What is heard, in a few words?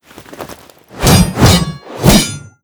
Wave Skill; Cyrus; Seer; GamigoNA